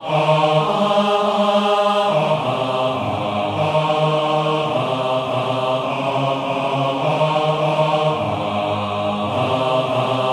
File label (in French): Basses